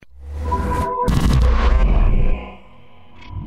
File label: Restart; Devil Daggers